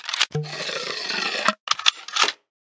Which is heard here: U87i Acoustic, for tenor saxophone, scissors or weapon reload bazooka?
weapon reload bazooka